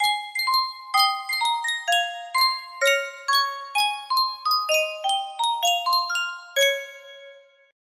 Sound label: sankyo miniature music box